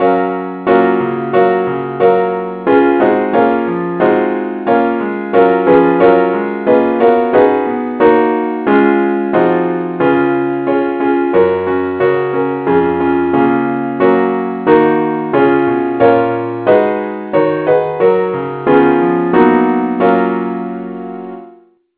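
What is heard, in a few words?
keyboard key G flat; saintthomas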